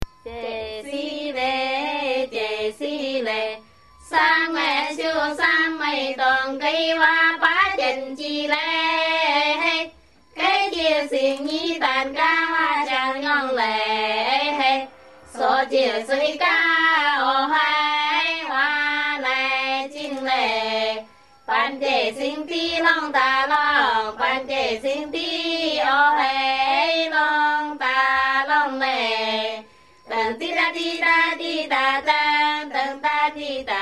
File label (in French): chine chengyang femmes chant